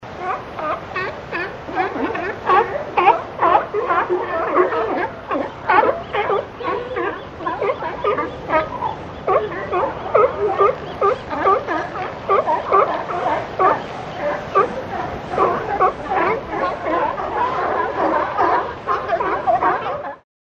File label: sea lion; animal sounds